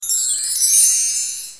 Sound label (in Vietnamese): Am thanh lam phep bang cay dua than